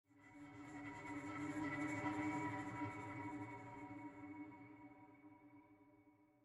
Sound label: Ghost Pad